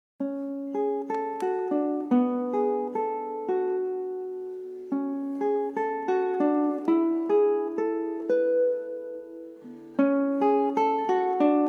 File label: Lullaby Fantasy